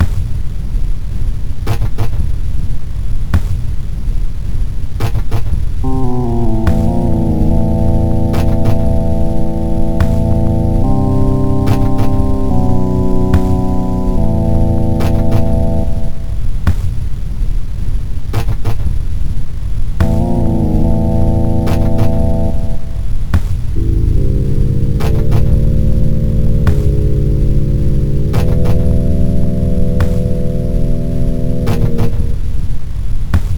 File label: clock tower